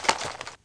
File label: scout inspect; czero opforce